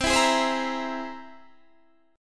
C Augmented 7th, Guitar, Standard; strummed; 20,19,18,17,17,18; chord; JGuitar

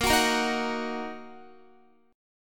Bb Suspended 4th Sharp 5th, Bb, down, Guitar; strummed; 4E,4A,5D,5G,5C,6F

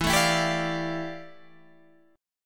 F Major 13th E, guitar chord

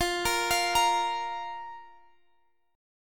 Bb 5th F

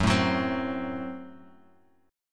F sharp Diminished, Guitar Open G; 4,2,4,5,x,x

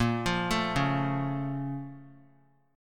A sharp Minor Major 7th; A#mM7 arpeggiated; JGuitar

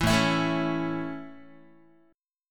A Major, Guitar; strummed

